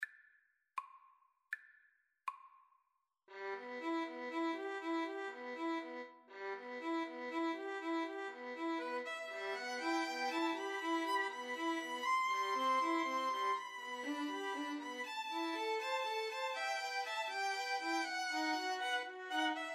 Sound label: 2 Violins Cello